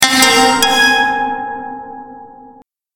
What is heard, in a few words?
with reverb